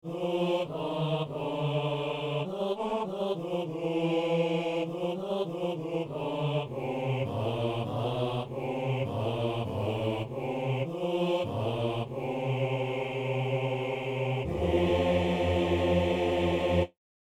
basse; Les anges dans nos campagnes

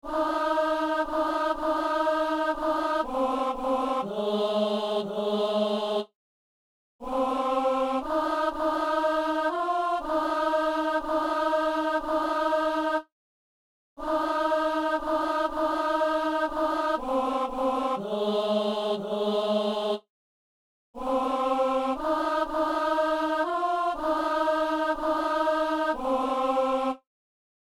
psaume alto; Psaume 41(42); Comme un cerf

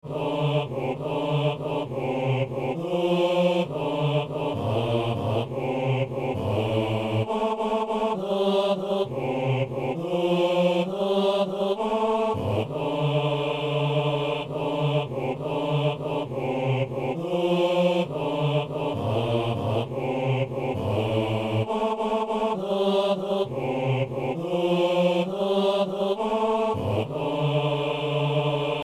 basse; Messe coutrillonne - Anamnèse

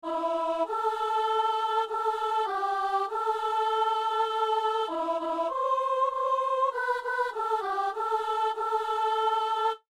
soprano; Psaume 97 (98); Messe du 17 janvier à Saint-Denis

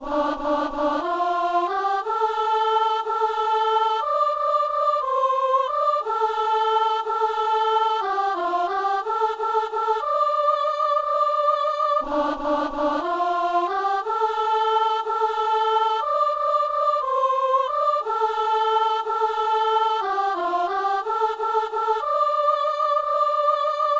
messe simple, gloria, partie a seule refrain, soprano

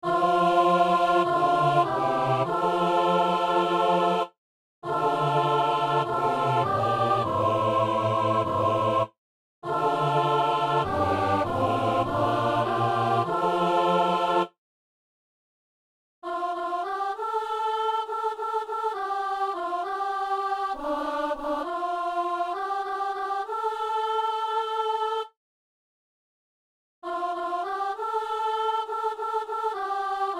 trois voix; Dieu est amour; Soprano / Alto (3 voix) / Hommes (3 voix); Messe du 17 janvier à Saint-Denis

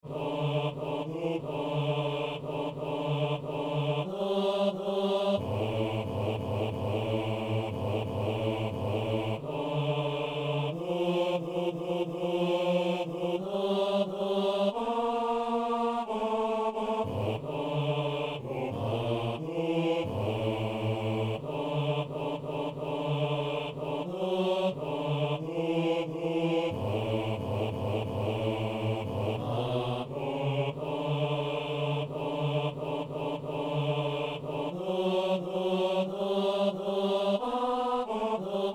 basse; Laisserons-nous à notre table

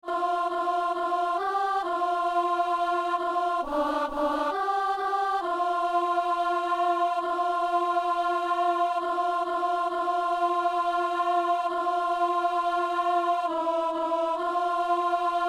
psaume alto; Psaume 101 (102); Messe du 17 janvier à Saint-Denis

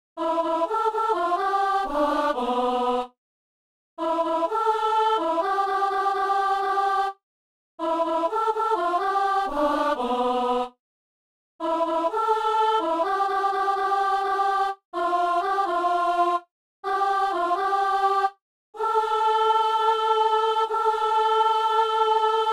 gloria, alto; Messe de Saint-François-Xavier - Gloria

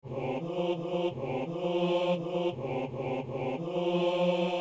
psaume hommes; Messe du 17 janvier à Saint-Denis; Psaume 18 (19)

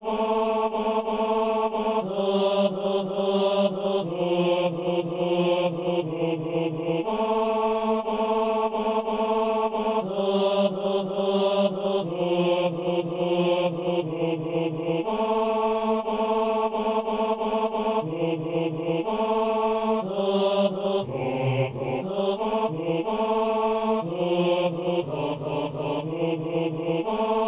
basse; Alleluia - Psaume 348

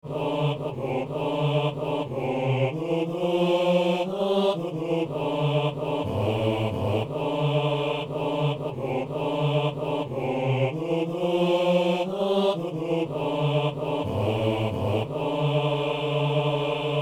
messe simple alleluia basse

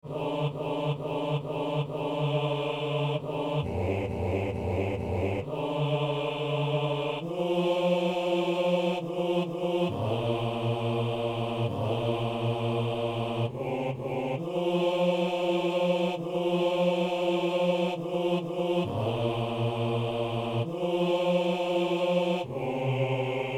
psaume basse; Psaume 101 (102)

